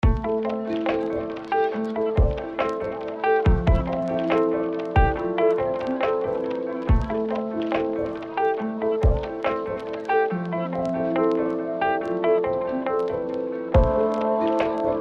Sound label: Lofi Relax